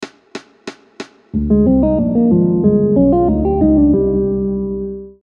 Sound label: triad